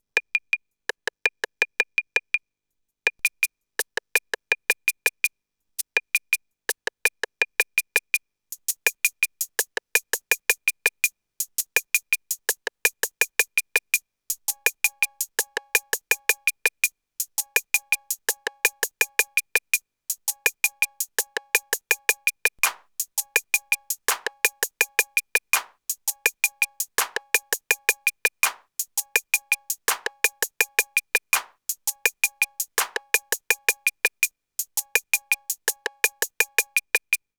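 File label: Percussion; SoundForce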